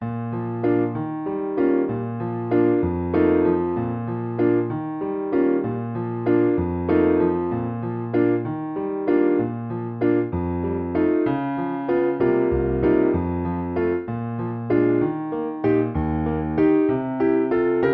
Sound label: piano